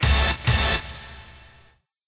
combo

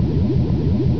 drone, ambience; Quake; PainKeep; PC Gamer 46

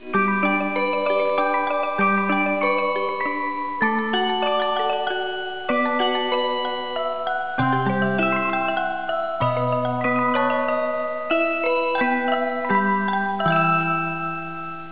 Country Holiday Exit Windows; desktop themes; x-mas

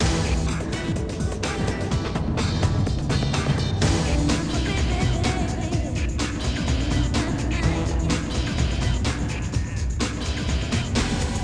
ditty; Nba2k

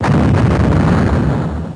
bonus

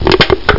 CRUSH; guess

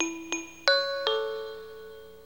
alarm; COREL SUITE8

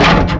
SAND; Speed Challenge

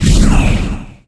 pad; TrickStyle

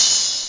O HIHAT; Groovy Bytes: Behind the Moon

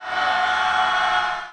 charge low

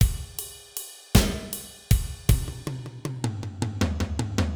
Fill, Blues; Pro Tools Blues

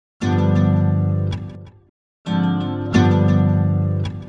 Guit; GUITAR; 88 BPM

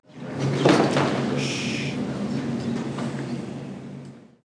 library, buildings

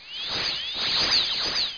Solid Laz; The War Engine

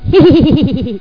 giggle; phonics

